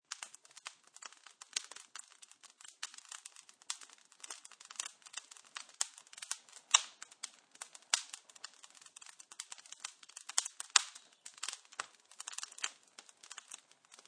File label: Crackling Fire, Fire crackles; Fireplaces; Household